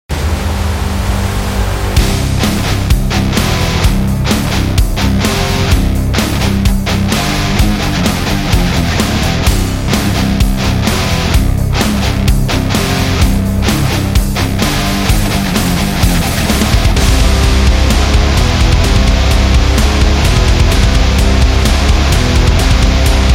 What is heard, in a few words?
Pure Evil Agression, metal